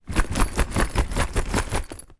suitcace small empty shake; foley

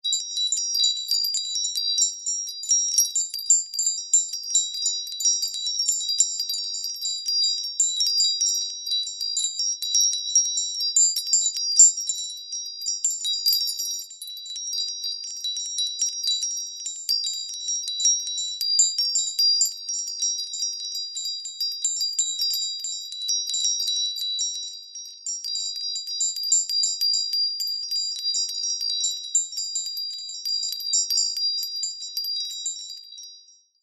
household wind chimes metal light movement chimes; zapsplat

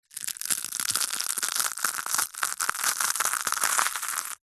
food cereal cornflakes handful squeeze crush

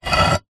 terracotta roof tile movement on top of other; zapsplat

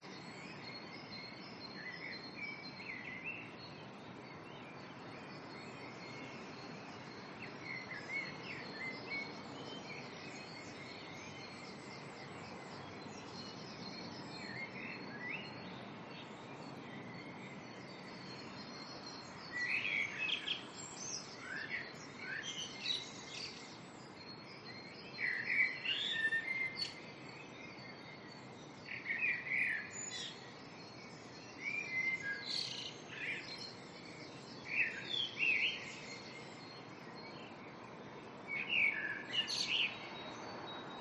RESIDENTIAL Early Morning Birds Distant Traffic

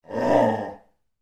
animal dog labrador growl internal

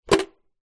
household tin jar teabags lid close

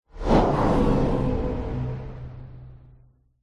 science fiction land vehicle pass by zoom fast; zapsplat

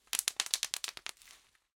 foley bubble wrap pops

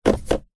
vehicles suv indicator stick nissan patrol

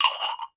household glass ramekins x2 clink; sound effects one

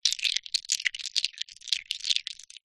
ice cubes movements in hand; food drink

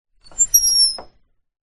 household door open or close squeaky hinge

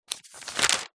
household magazine cheap page turn

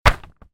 twister game sheet hand down

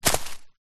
footstep single twigs sticks; zapsplat

